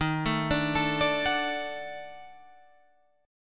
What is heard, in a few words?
Eb Minor Major 7th Flat 5th, down, Guitar, Drop D